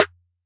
snare G, hip-hop drum kit; 99Sounds x Monosounds; Moon

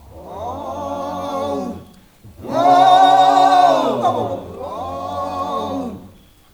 AOOH AOOH, M. CHOIRS, Heart of Africa; Spectrasonics

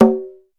HI EDGE DRUM; 90 sSampleCDs; Crypton - DrumBase5000

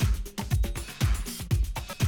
120, Classic Drum Loops

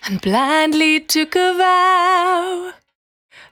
Cry 68bpm B, Vocal; Zero-G

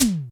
E Tom, Yamaha DTXpress

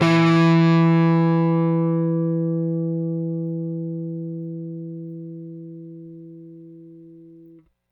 Rick, Rickenbacker Bass; Airwindows

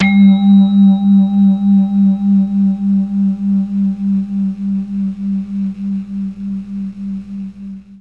G1 VIBES, Mellotron MKII; Mike Pinder - Mellotron Archive CD

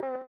VIBRA WAH, guitar; Spectrasonics; Bizarre Guitar

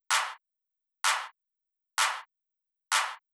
Drum Loop 128BPM Clap; VEC5 Drumloops; VEC5 Multi Loops; Loop Elements

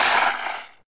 Overture; SNES; Romancing SaGa

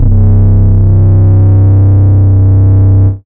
Bass C1, club techno

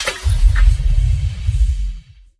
impact spectral impact, transition; Polyend; Recue

